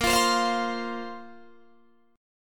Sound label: A sharp Suspended 2nd down Guitar Standard; strummed; A#sus2; JGuitar